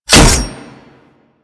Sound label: Barrage Hit Metal; Warframe; Warframes